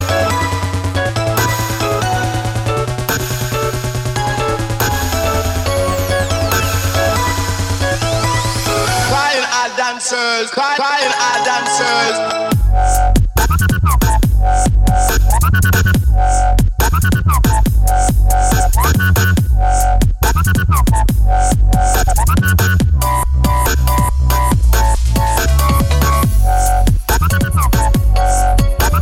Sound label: hip hop beat